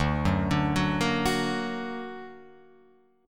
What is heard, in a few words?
D Augmented D down Guitar Open F; D+ arpeggiated; JGuitar